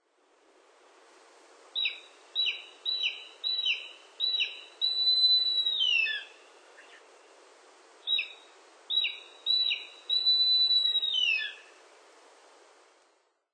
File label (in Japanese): kumataka, nikko